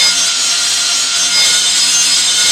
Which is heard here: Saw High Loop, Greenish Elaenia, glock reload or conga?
Saw High Loop